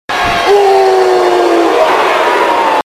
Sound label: yell; Roman Reigns